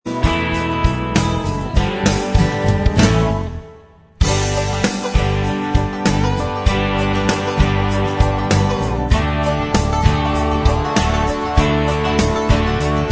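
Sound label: Inst Track, instrumental track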